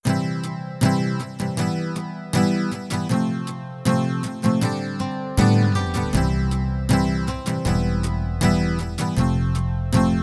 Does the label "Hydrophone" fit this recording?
No